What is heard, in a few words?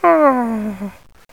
Awake Squeak; garfield kart